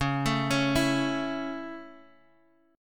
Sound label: Db Minor Sharp 5th Db down Guitar Open G